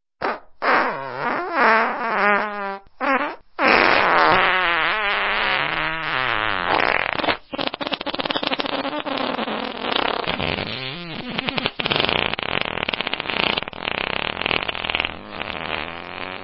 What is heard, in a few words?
fart sounds; for ringtones